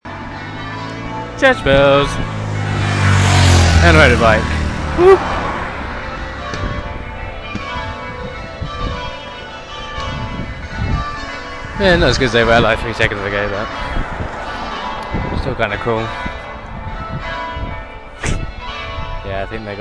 bells

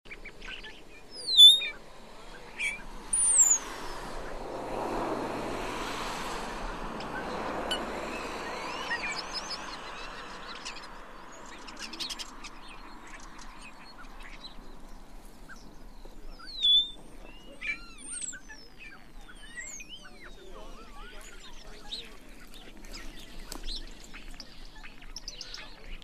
soundscapes of the south hengistbury head

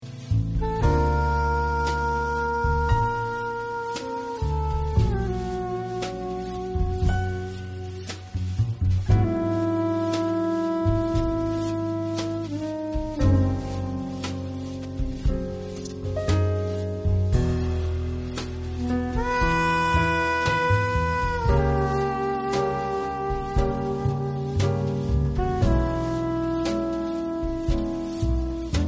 jazz academy

